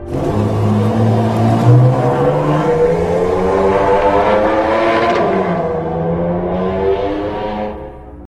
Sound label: Car Engine Sound